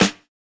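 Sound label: CLAP snare; Drums In Your FACE!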